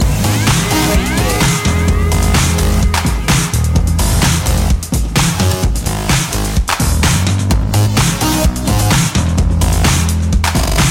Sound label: robo-disco loop